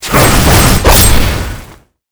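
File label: guardian Thoth attack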